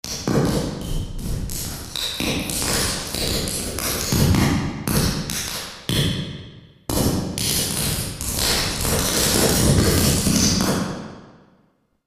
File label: Room, reverbs; SPX900